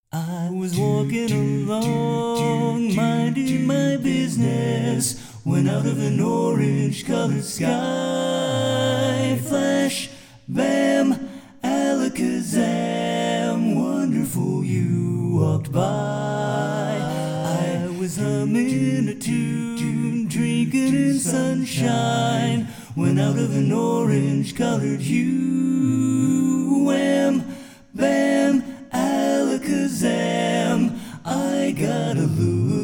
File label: LEAD